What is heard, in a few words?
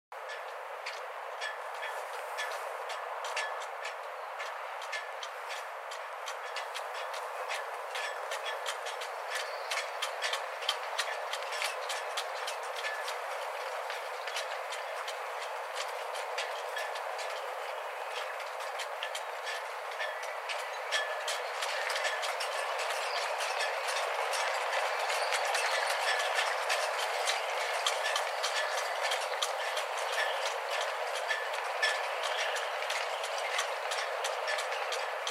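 strong wind blowing through the shipyard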